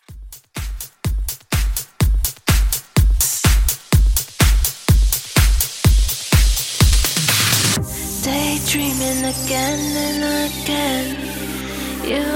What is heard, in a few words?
Club Extended Mix 125 Bpm